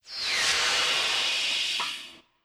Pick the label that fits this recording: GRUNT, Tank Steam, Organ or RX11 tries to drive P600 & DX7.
Tank Steam